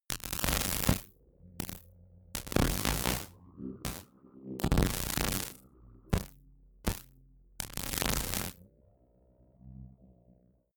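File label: simple crackle sequence, science fiction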